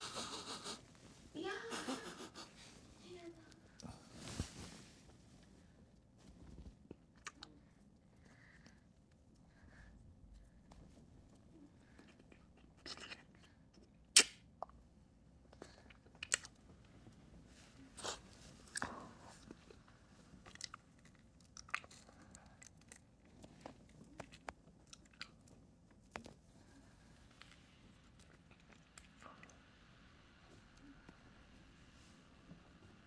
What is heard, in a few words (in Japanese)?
クンニ